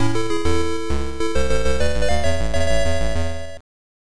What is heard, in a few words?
Round Clear; The Return of Ishtar; MSX2